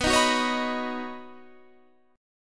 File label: B Major Add 9th, B, down, Guitar Standard; strummed; JGuitar